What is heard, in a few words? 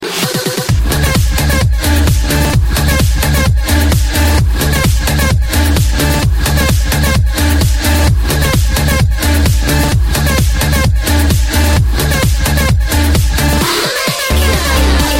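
Electro House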